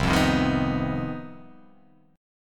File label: C Minor Major 7th Double Flat 5th chord